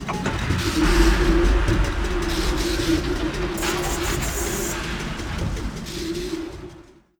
event construction; Stellaris

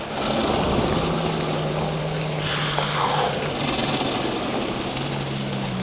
swarm queen idle; Stellaris; other entities

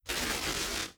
reality breach spark; Stellaris; nemesis

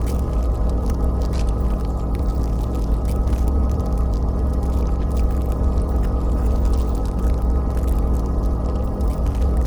pro ship stinger idle; biogenesis

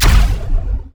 plasma fire, weapons; Stellaris

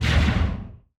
distance plasma fire, weapons; Stellaris

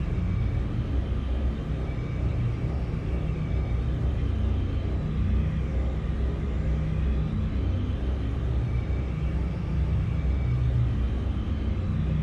ship idle; skrand; Stellaris; paragon; ships

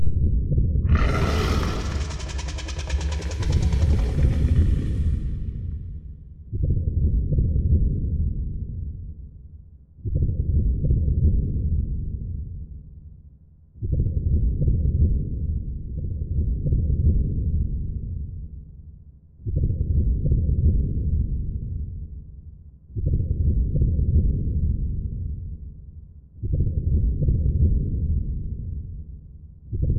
aquatics dragon idle

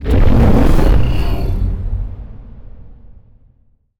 necroid moving out destroyer; Stellaris; necroids

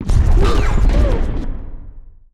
auto cannon hit medium; weapons; Stellaris

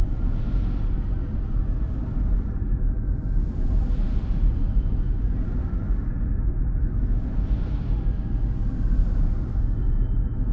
amb starbase mercenary; Stellaris; overlord; Starbases

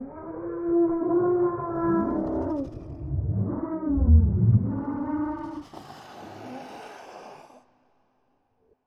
voidspawn death; Stellaris; reanimated

